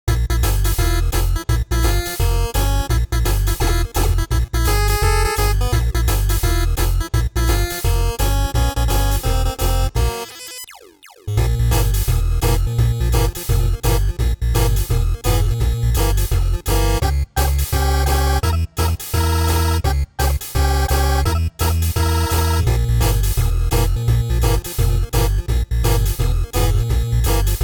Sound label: end music 8bit